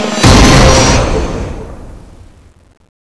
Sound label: final attack